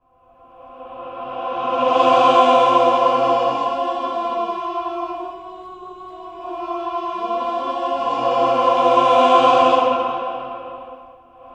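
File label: cluster reverse, voices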